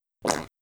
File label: raspberry